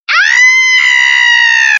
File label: Aaaaaaaaaaaaaaahhhh